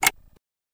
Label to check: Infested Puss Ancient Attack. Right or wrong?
Wrong